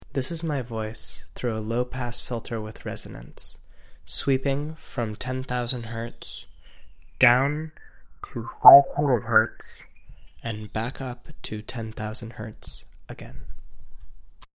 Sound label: lowpass with resonance